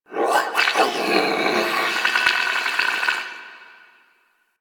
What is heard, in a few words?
Sentient Lair Interior Creature; Ambience; Creatures; Misc Creatures; Warframe